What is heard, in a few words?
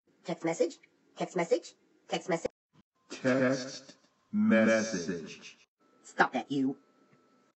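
2 Tone Txt Message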